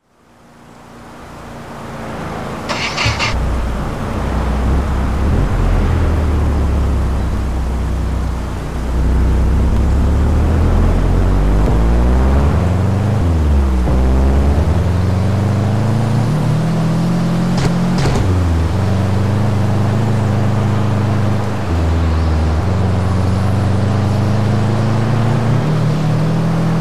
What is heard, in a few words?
subaru impreza wrx sti 22b stock; TDU-1